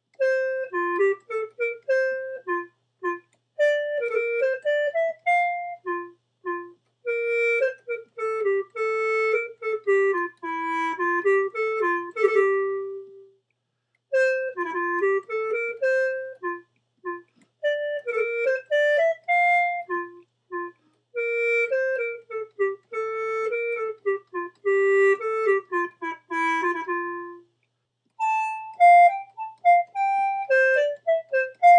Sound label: claviola; bach